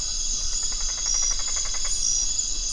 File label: Polypedates colletti